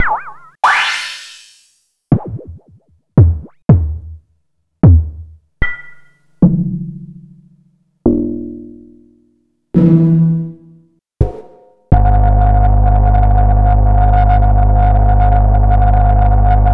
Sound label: Bongos and Pings